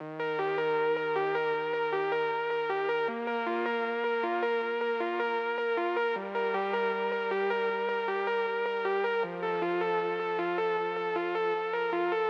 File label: Lead Synth 156bpm Bb